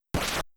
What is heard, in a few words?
Bash; mother1